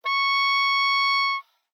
Oboe ff Db6 stereo; Woodwinds

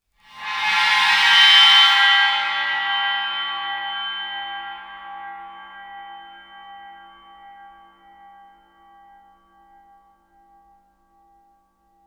chinese bow, Suspended Cymbals bowed; Percussion

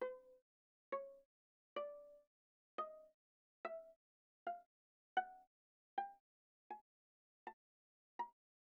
Viola pizz sul A pp C5 B5

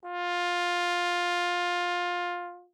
Tenor Trombone ff F4; Brass

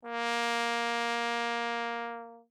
Tenor Trombone ff Bb3; Brass

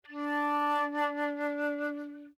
Flute vib ff D4 stereo; Woodwinds

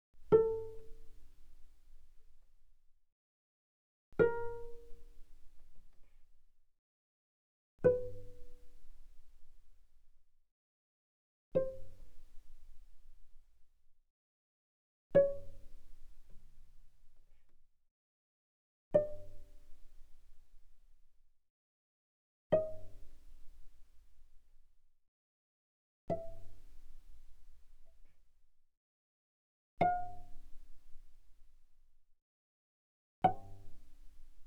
Cello pizz mf sul A A4 A5; Strings

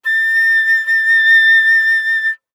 Flute vib ff A6 stereo; Woodwinds

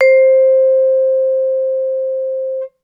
Vibraphone short sustain ff C5 stereo; MIS Pitches - 2014; Percussion

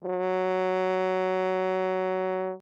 Horn ff Gb3 stereo; Brass; MIS Pitches - 2014

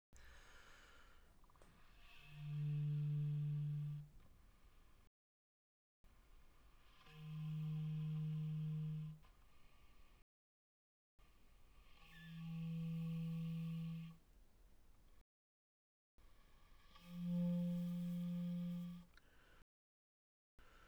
Bb Clar pp D3 B3, Bb clarinet, woodwinds

